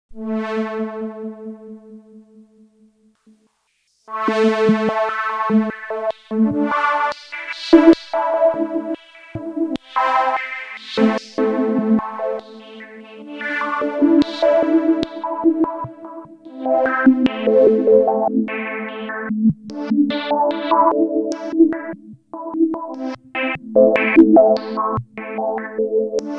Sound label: Spatial Delivery Synth Sample and Hold; Earthquaker Dievices